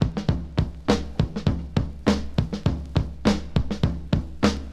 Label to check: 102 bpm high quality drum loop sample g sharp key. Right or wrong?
Right